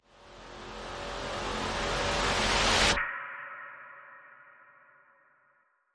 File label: One Shot Effect Riser